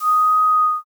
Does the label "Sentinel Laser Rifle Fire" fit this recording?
No